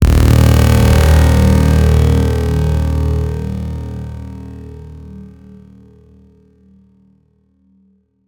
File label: HIT SciFi, Cinematic Hits Designed